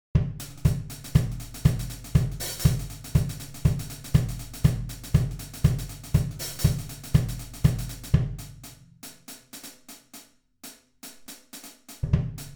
Groove, drum-sets

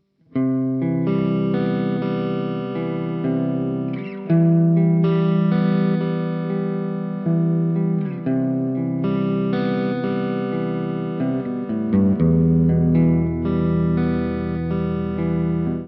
TwinR SHOD, guitar, TwinR – SHOD Overdrive; ReTube Custom Shop

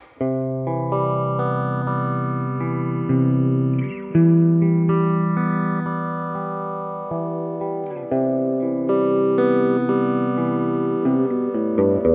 Deluxe1 P90, guitar, Deluxe1 – P90 Phaser; ReTube Custom Shop

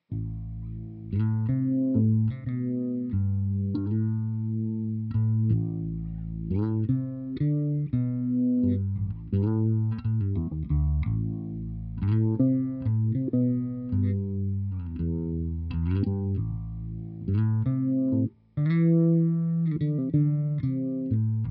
BASS; DComp is a digital re-creation of a classic guitar compressor pedal

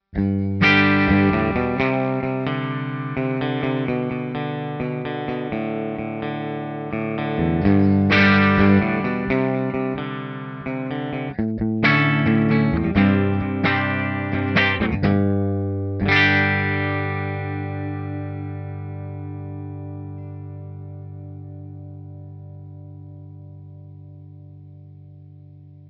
Rock TwinR, guitar; ReTube Custom Shop

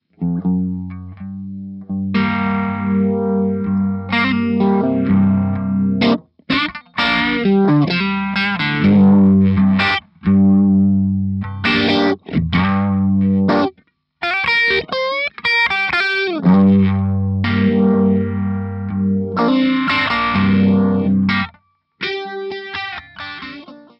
lead Uni V, Lead UV demo

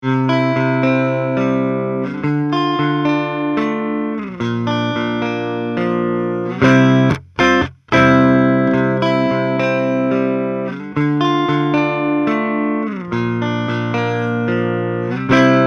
Delay

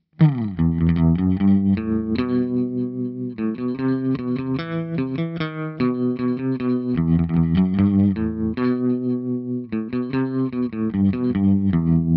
Surf-Rock TwinR Tremolo, guitar; ReTube Custom Shop

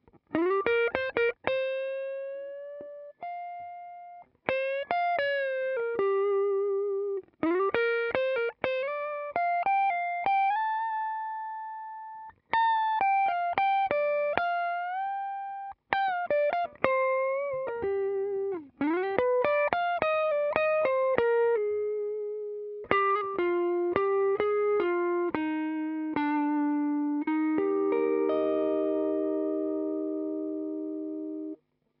DComp, Clean Electric Guitar 4; compressor; Deluxe1

